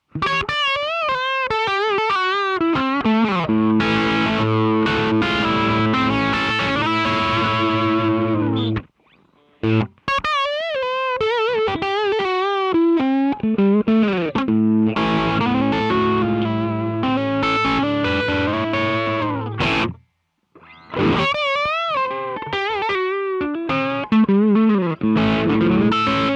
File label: JBjr drive